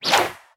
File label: Fishing Rod Swing